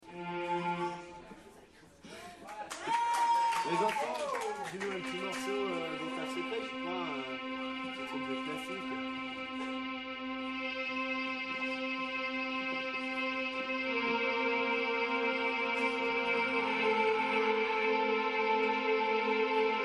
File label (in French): grande impro clavier, concert